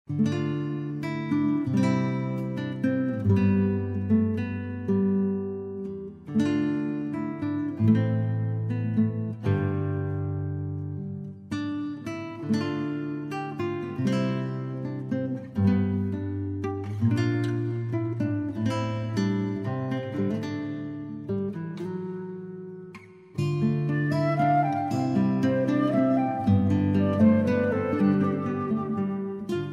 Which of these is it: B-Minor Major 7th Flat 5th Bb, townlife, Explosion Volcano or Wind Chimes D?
townlife